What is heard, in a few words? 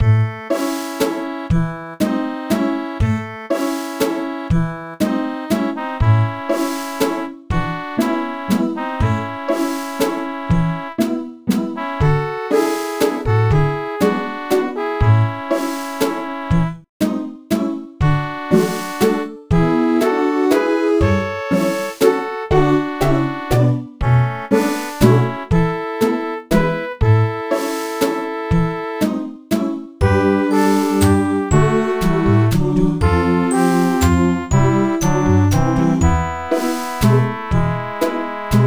Balloon; Tottoko Hamtaro Nazo Nazo Q Kumo no Ue no Hatena Jou; NDS